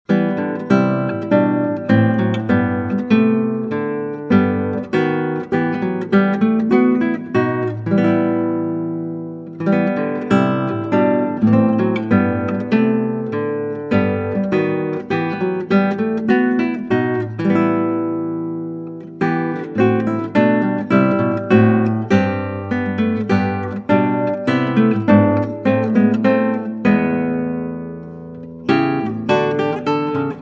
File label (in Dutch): Klassieke Gitaar; Bach 50 Solos